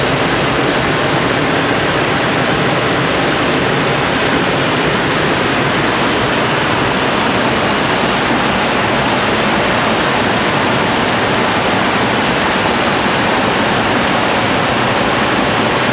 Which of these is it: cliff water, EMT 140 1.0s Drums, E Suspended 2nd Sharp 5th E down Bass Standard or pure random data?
cliff water